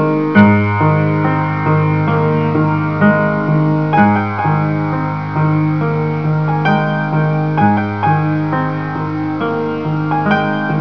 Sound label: piano